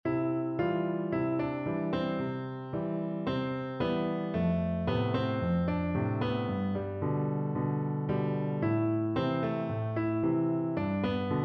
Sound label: Chords